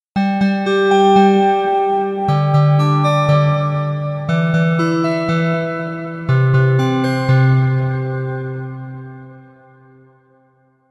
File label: Melody